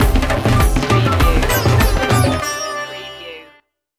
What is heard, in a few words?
funky india stinger